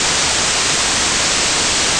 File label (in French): bruit blanc